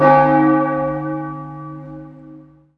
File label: big bell